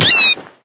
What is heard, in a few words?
death, bat; minecraft; mob